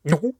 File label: Hit